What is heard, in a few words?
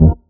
Chords Tones; Equipped Music; Loungin House